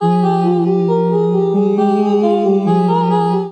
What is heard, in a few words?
Chromatic Voice F Minor 136 bpm; MIDI Clips; Ableton; Factory Packs; Voice Box